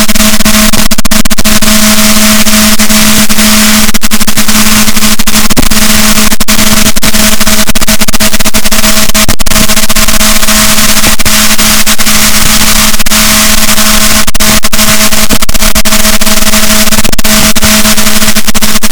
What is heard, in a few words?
rorquals, Balaenoptera Physalus, boat